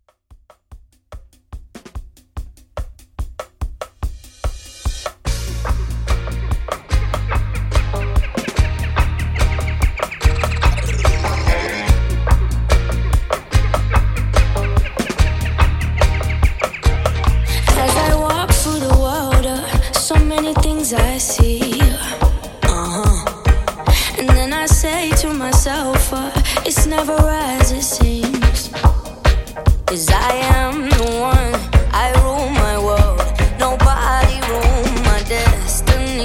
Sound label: Dance Reggae 145 Bpm